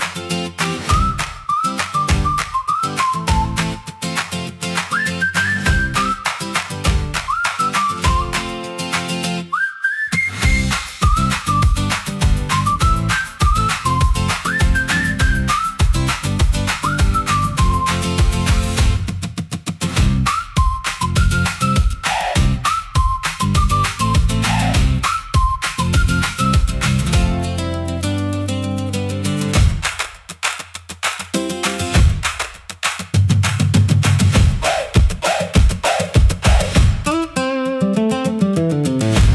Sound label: Morning Walk Whistle